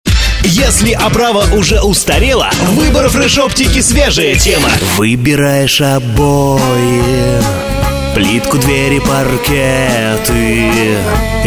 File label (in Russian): vokal